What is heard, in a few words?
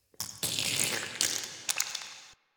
Interior Squish Gurgle; Ambience; Misc Indoor; DE; Warframe; Infested Eris